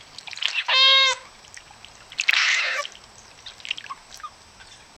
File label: the cub paddling strongly; animals